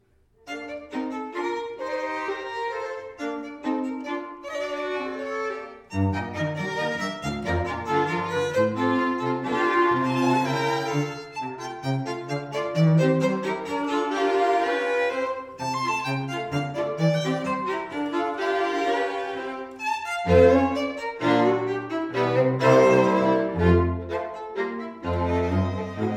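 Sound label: quartet g minor; thieriot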